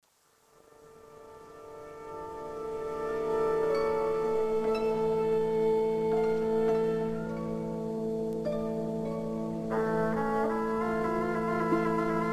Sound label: Flamenco